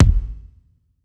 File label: Elec Kick, drum